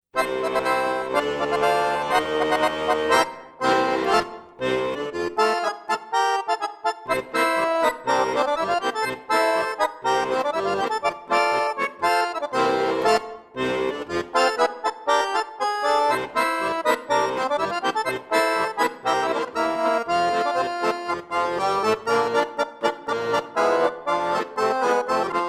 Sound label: German Polka, Accordion